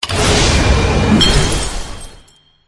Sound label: Gem Synthetic; the great ottomans strategy battle for throne android